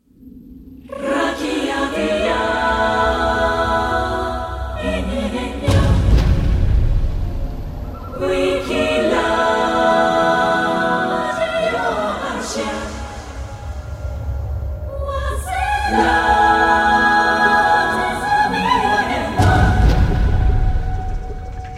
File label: Harmonics Eolia